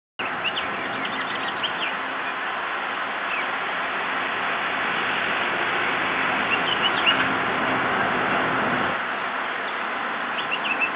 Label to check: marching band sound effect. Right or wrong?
Wrong